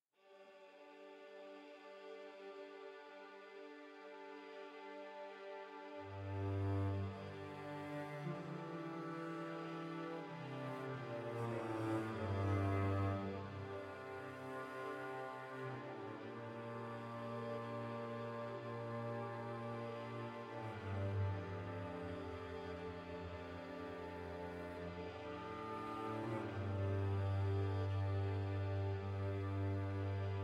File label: mus ambient